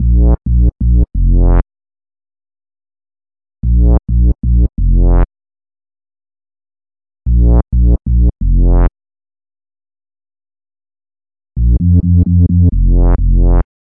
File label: 130 2step whoppabass